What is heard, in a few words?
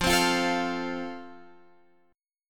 F Major, Guitar, Open F; strummed